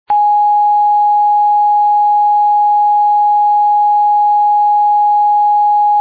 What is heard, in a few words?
g sharp, tuning, recorders